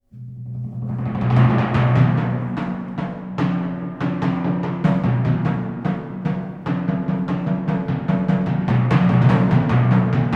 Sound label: Timpani Solo Adventure